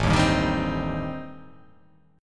A-Minor 7th Flat 5th-A-down, chord; Am7b5 strummed; JGuitar

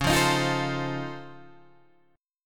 Db Augmented Major 9th, down, Guitar, Open G

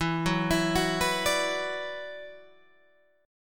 E 7th Suspended 2nd E down Guitar Open G

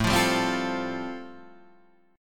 A-Minor 7th Sharp 5th, chord

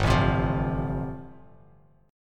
G 7th Suspended 2nd Suspended 4th, Bass Standard; G7sus2sus4 strummed

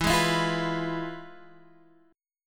F Minor Major 7th Flat 5th, E

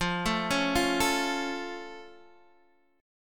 F Augmented F

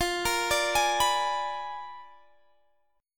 A sharp 7th Flat 9th F

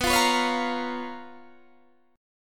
Db 13th B, guitar

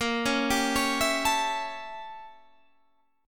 A 7th A sharp; A7/A# arpeggiated

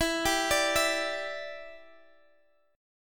E Minor 7th, down, Guitar, Open G

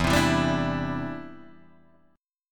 E Minor 7th Sharp 5th E; strummed

